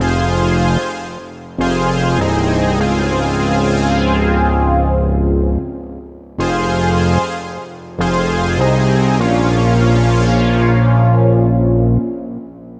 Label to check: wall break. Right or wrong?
Wrong